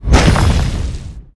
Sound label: skill explo